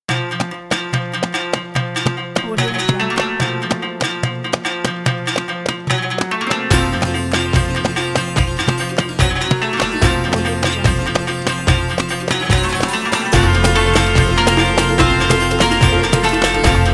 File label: arabic intro logo